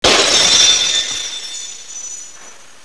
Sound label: CRASH; Games